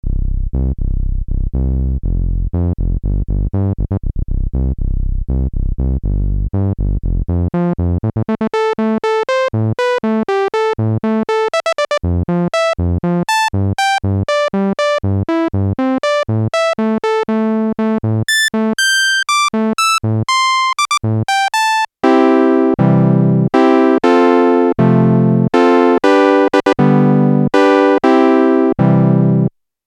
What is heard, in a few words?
gem s3 turbo filter demo lpf